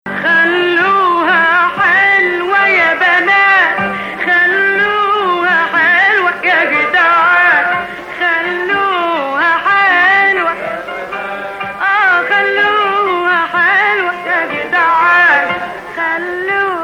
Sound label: Ajam flat 6; Zanjaran